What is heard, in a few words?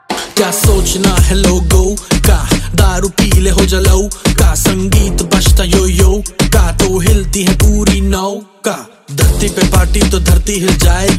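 punjabi ringtone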